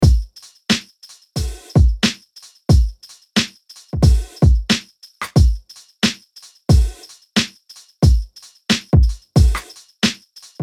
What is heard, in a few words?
Lo-Fi 90BPM